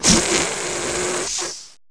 Jetpack Rubber Loop; Rabbids Big Bang; android

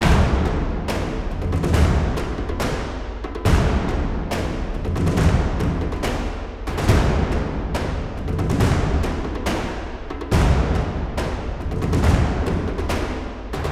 Drumloop; Chompy Boss Igc

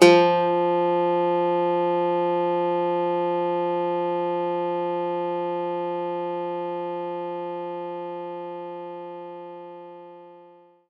Nylon Guitar F2; knights try

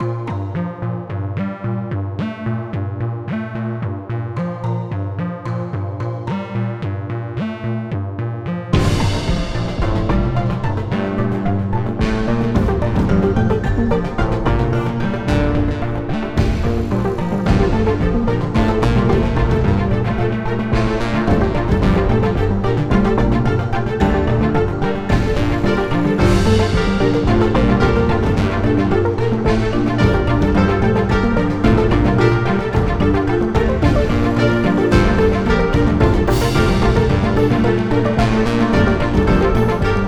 PUZZLE SOLVED; escape academy

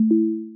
Correct Mem Fragment S F X

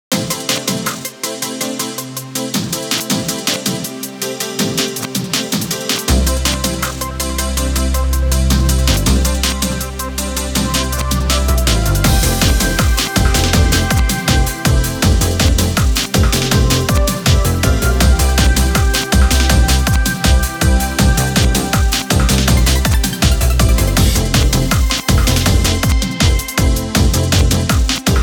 karaoke

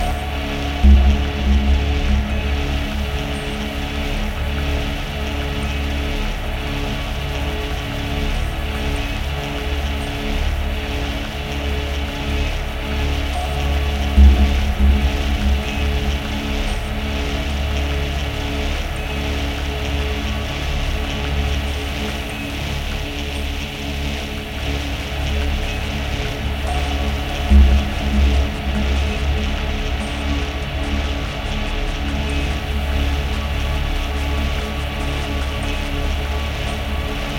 The Boat Ambience; mirror's edge gamerip

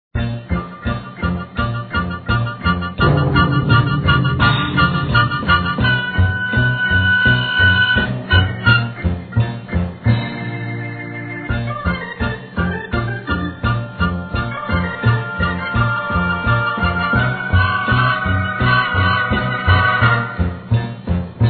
Gameplay Loop; Tom & Jerry's Trap-O-Matic

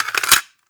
Load Cassette

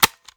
Button

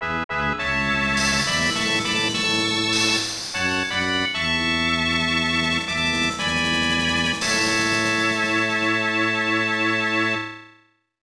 American National Anthem; super batter up; snes

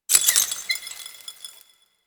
Field Mat Tap; Yu-Gi-Oh! Master Duel

